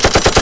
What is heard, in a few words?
marine shoot; spacehulk